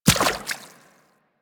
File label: Infested Medium Run Water, footsteps; Warframe